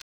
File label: Keypress Delete; effects; khadas edge2